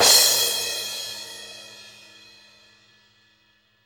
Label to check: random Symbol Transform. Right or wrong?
Wrong